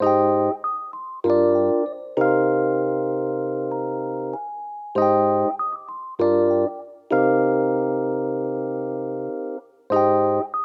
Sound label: Classic Melody Loop - 97 BPM C Min E Piano; Cymatics; Oracle Sample Pack